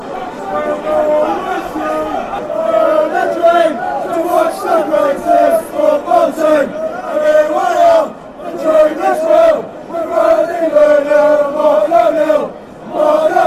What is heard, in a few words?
we've had dream